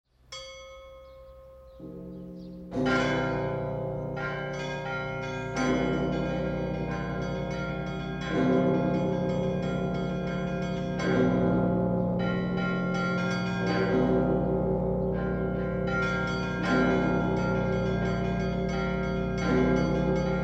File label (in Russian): Колокола России. Ростовские звоны Ионафановский звон